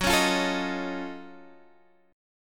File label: F sharp Minor Major 13th, Guitar, Open G